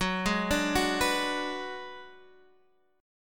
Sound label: Ab Minor 7th Flat 5th Gb